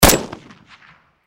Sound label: Gun Rifle, 7.62 Caliber Dragunov Svd Bolt Action Single Shot; Rifle Sound Effects; Close Perspective; Blastwave; Warfare